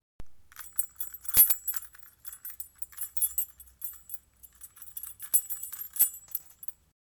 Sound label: Keys Shaking